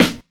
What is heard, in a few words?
low tone snare single hit a sharp key